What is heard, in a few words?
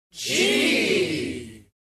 Crowd in sync